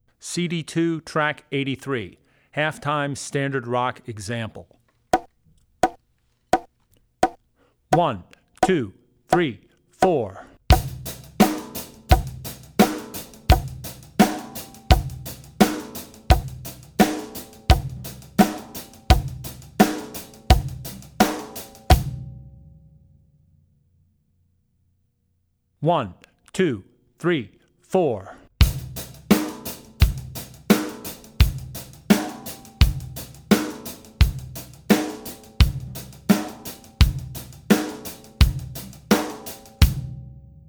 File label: Rock N' Roll - Half-Time; Drummer's Bible Tracks